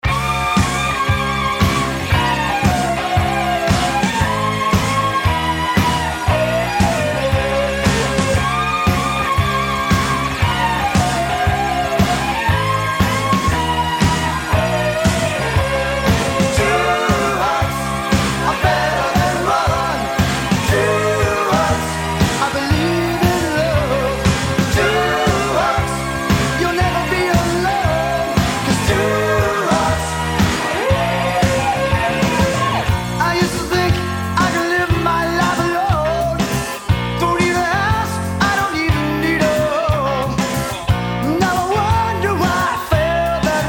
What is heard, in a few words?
Sax